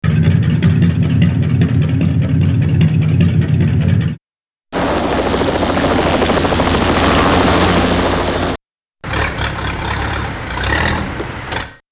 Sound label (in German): schiff hubschrauber trecker